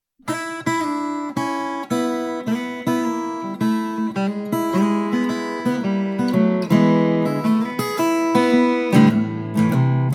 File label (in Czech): Obycejne blues Edur